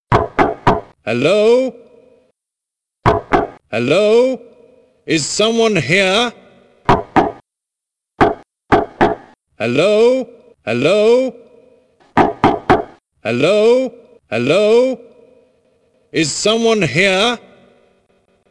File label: Hello knock